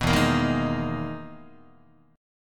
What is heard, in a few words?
E-Major Sharp 11th E, Guitar; EM#11 strummed; JGuitar